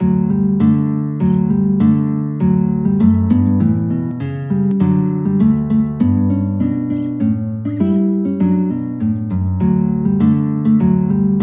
guitar

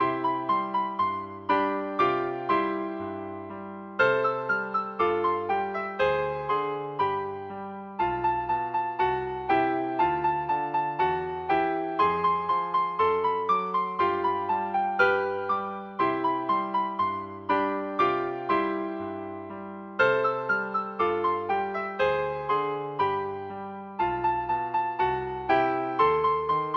piano duet; tchaikovsky reed pipes

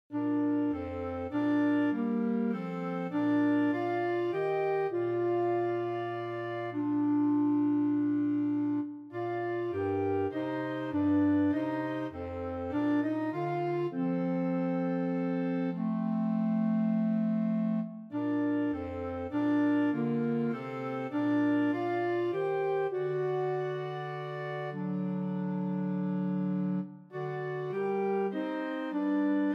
organ

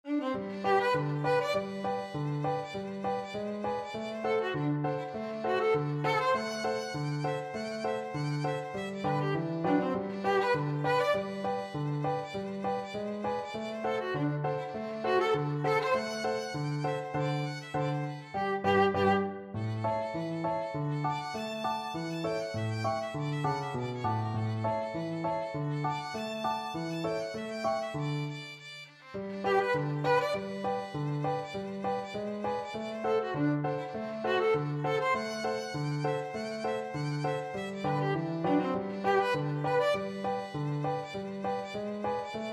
clarinet polka

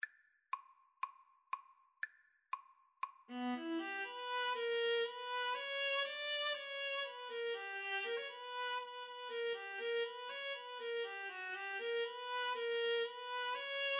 Bulgarish viola viola

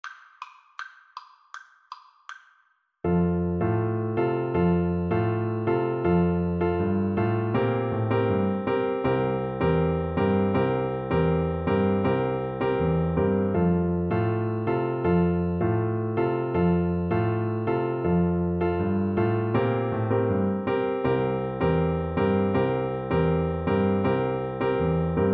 la cucaracha FL kar, Flute

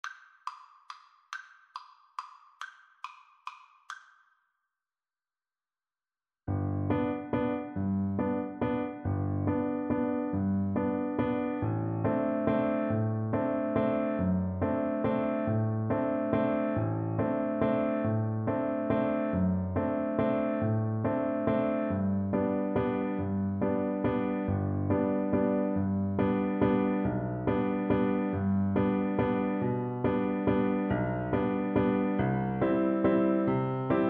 DB, Double Bass

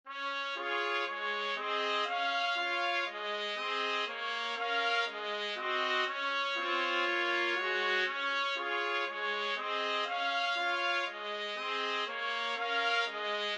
trumpet trio

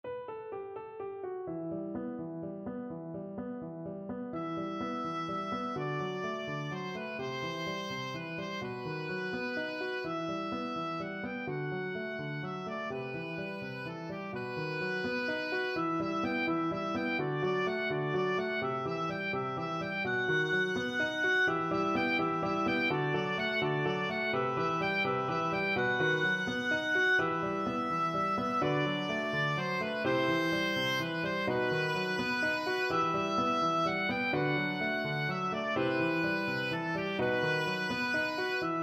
spanish violin, oboe

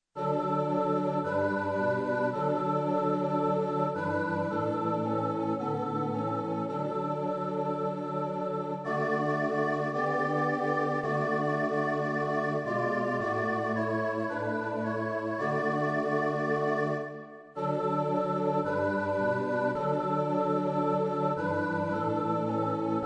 hymne an die nacht, Choir; Beethoven, Ludwig van - Hymne an die Nacht for Choir

